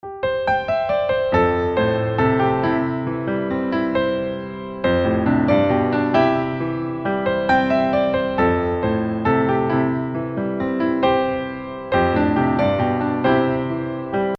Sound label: Piano